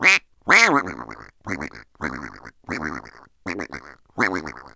uccelli birds, animali

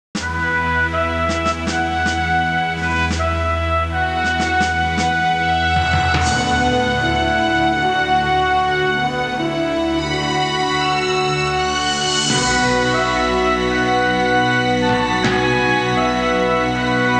musica sinfonica; symphonic

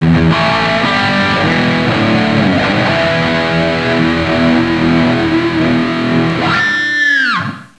suonerie musica rock

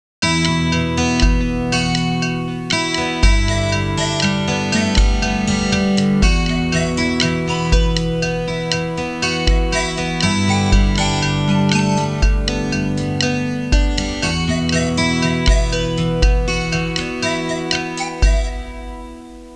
suonerie musica hawaiian

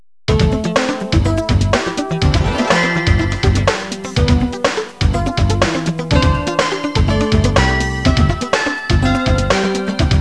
suonerie businessy

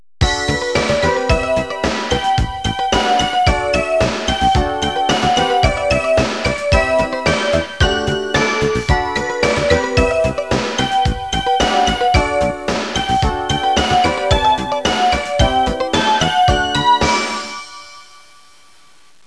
suonerie businessy

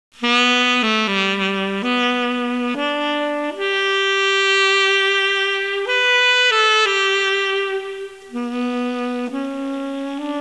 suonerie musica jazz

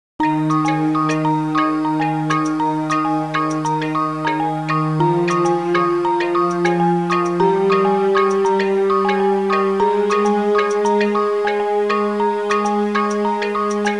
suonerie musica ritmica

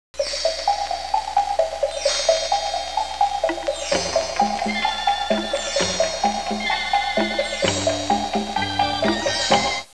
suonerie musica ritmica; rhythm

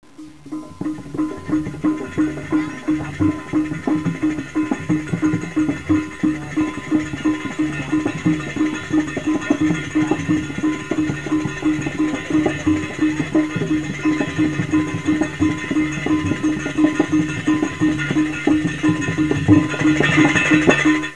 suonerie musica ritmica

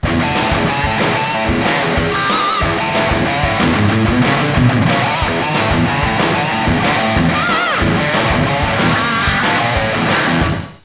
suonerie musica rock

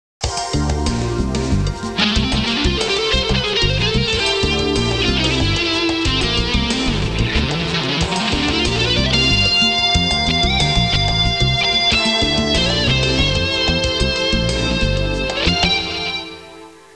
musica urban

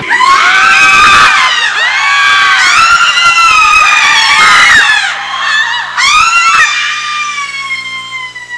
suonerie persone urlare

